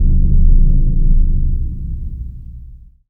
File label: BIG BD, DRUMS; Spectrasonics; Heart of Africa